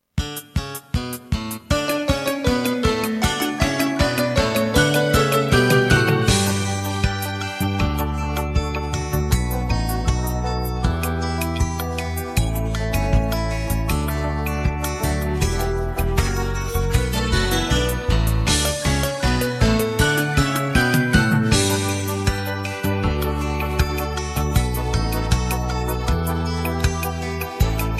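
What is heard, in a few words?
backing track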